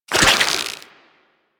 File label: Gas Arrow Tether Attach; Warframe; Infested; Derelict Cernos Prime